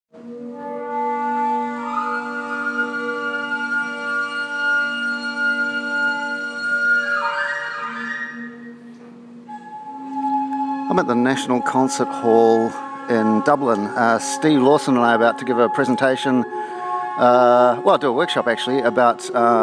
men blowing into tubes

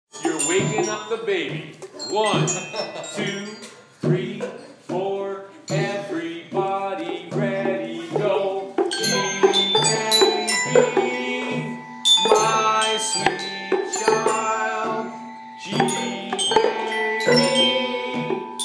lullaby

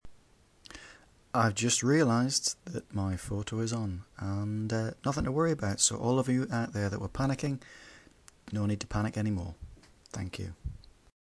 phew

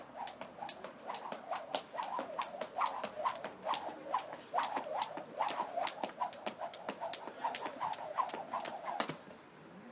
skipping

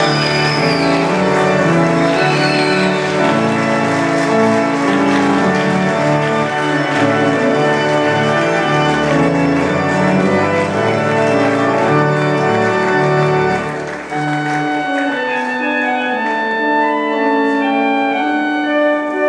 trumpet voluntary

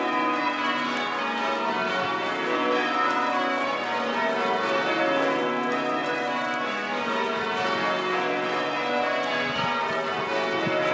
ash wednesday cathedral bells

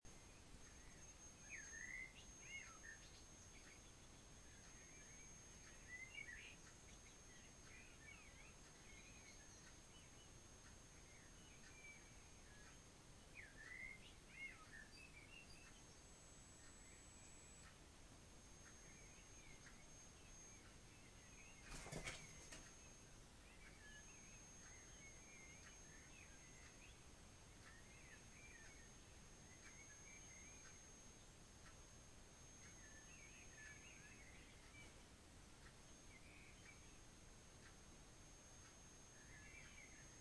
dawn chorus

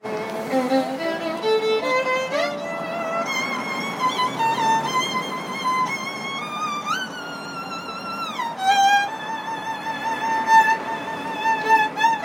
playing the violin; so i requested a song

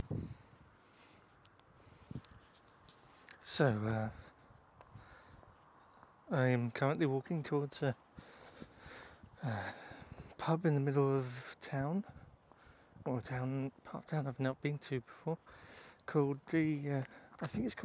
pub walk; street fighter

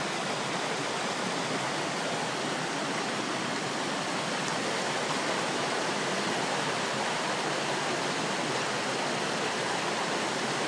full cornish stream

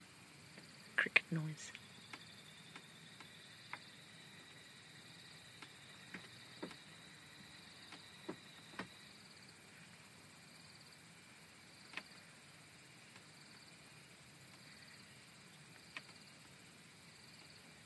crickets

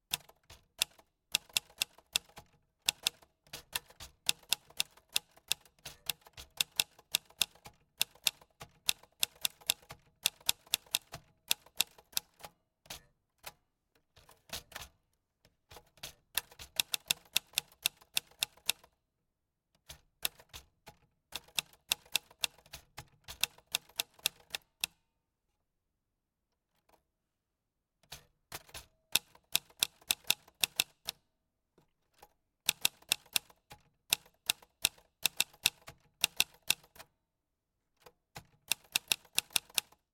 Kochs Adler Nahmaschinenwerke typewriter

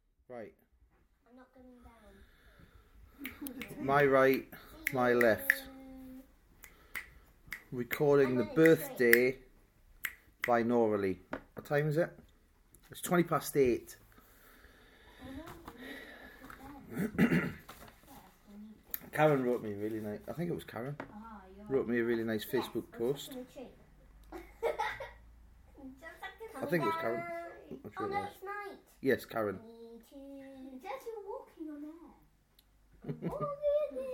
binaural birthday soundscape

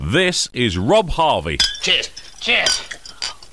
cheers

